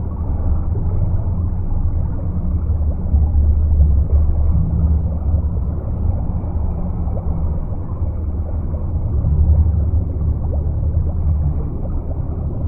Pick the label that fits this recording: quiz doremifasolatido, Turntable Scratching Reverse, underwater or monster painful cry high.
underwater